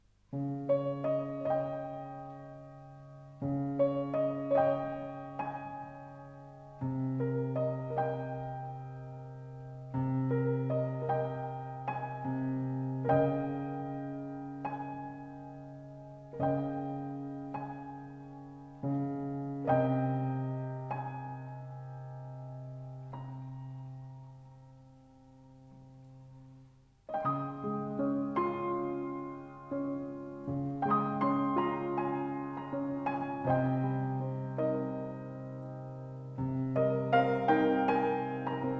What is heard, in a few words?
Cello